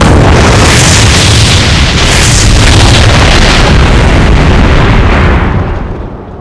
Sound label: GROBOUM, ambience